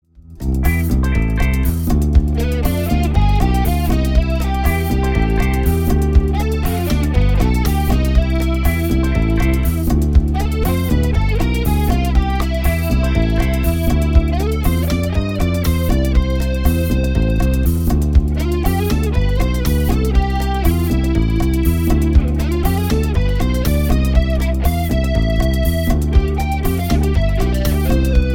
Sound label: lead